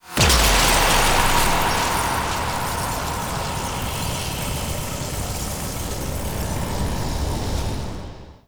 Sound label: Power Cast; Warframe; The War Within; Mirror; Cinematics